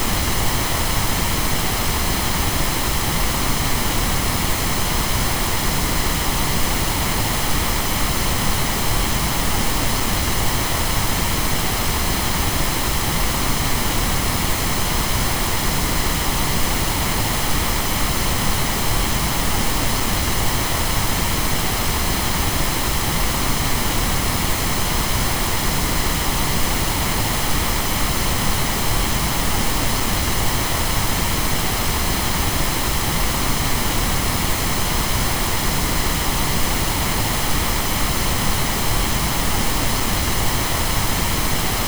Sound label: Pink Noise